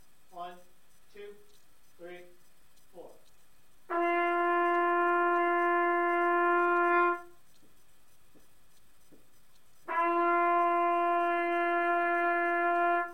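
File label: trumpet 1st 5 steps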